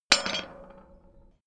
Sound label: small metal drop